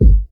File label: smooth kick drum G key